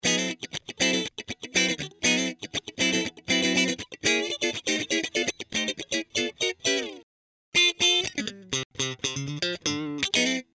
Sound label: Funk